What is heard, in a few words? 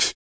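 vox percussion, Vocal Planet Jazz FX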